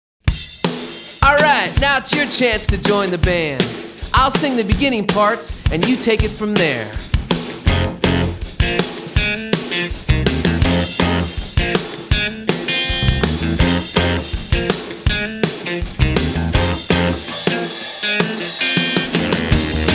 karaoke

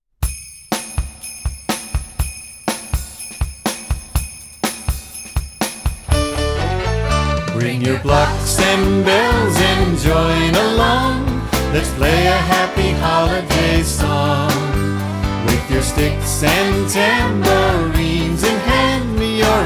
Jingle Bell Band

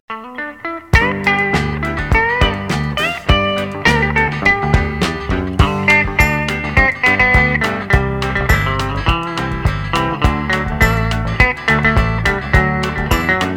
Guitar Player Instrumental

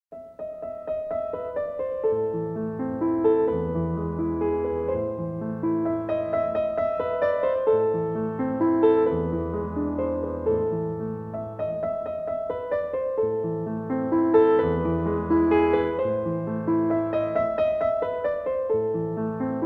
Beethoven Bagatelle In A Minor; Fur Elise

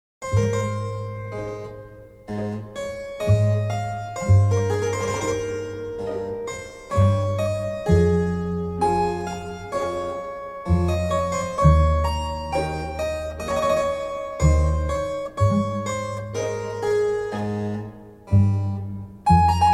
Concerto No 5 In F Minor

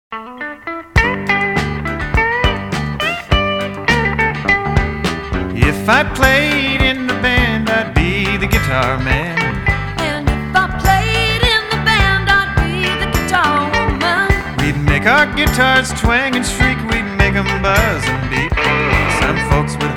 Guitar Player

